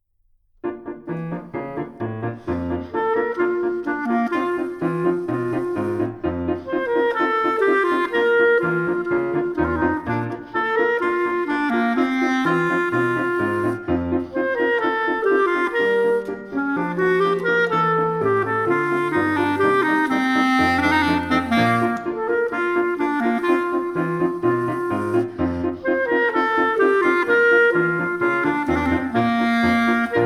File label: Skilful solo; Skilful Solos